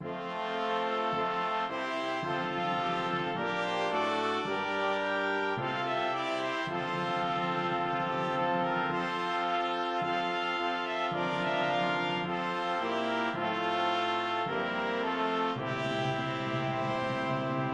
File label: When I Survey Brass